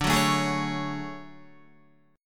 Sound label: D Major Sharp 11th, down Guitar; strummed; JGuitar